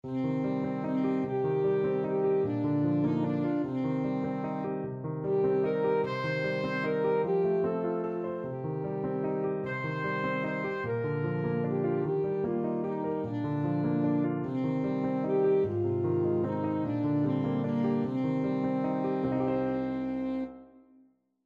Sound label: saxophone